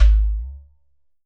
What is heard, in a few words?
GHATAM